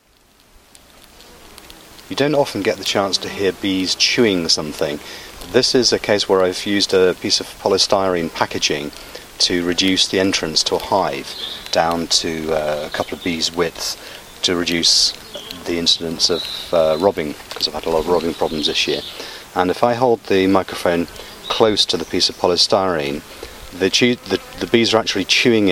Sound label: bees chewing